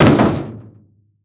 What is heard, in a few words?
Foley Footstep Work Boots Metal Jump; Foley Footsteps